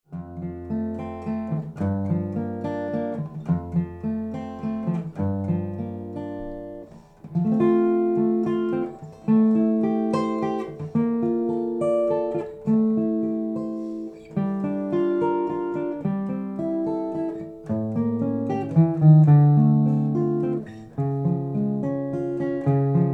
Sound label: Solo Guitar; acoustic guitar